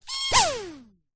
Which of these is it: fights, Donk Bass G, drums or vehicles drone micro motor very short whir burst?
vehicles drone micro motor very short whir burst